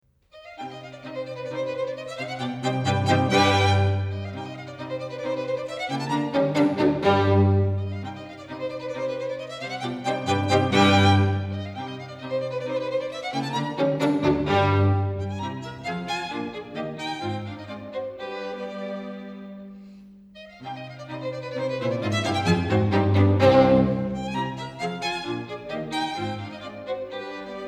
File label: String Quartet in C Minor Allegro; String Quartet No. 4; Op. 18 No. 4; Ludwig van Beethoven; Beethoven - String Quartets Nos. 1-16